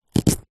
carrot slice knife; zapsplat

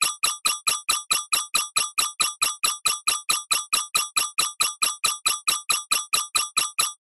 science fiction alarm emergency beeps warning

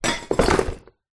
saddle place down on ground; animals

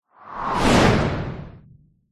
cinematic whoosh fast close doppler; sound design

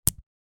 marbles x2 hit

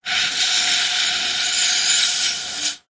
foley cardboard tube blow air across rim opening; zapsplat

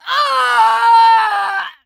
Screams Shouts Female Scream

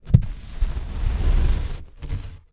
wood hardboard thin move drag carpet; foley

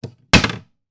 household bread bin lid wooden close; sound effects one

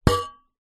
foley tin can with lid peeled back hit lid twang

